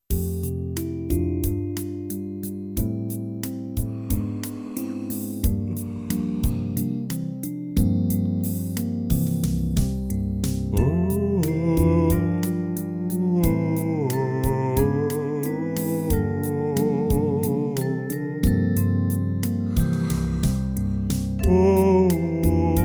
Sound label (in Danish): Sang